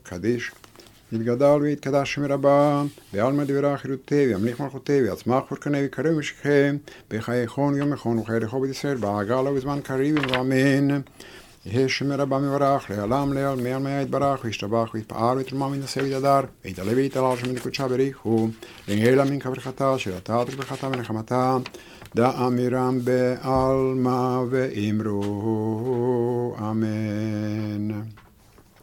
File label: Half kaddish; Kippur Musaf